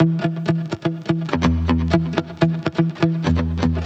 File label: guitar loop 124 E